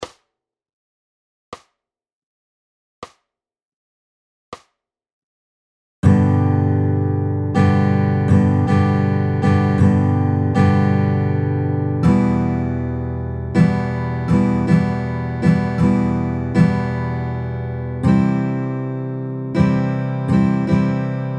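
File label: basic syncopation G Em C D at 80 bpm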